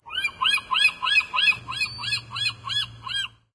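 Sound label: rural seagull; random amb sfx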